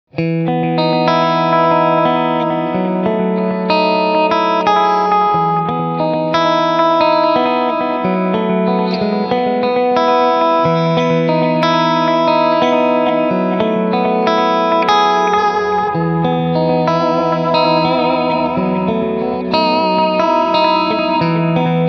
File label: Delay Compressor